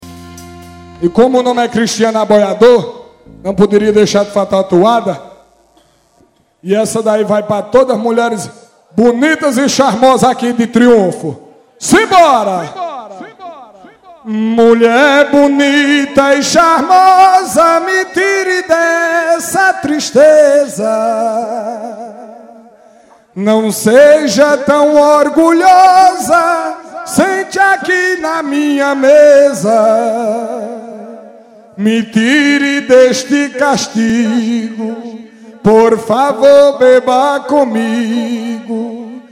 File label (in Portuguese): aboiador